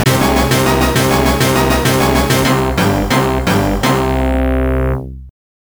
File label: Stage Clear; Stage Clear 2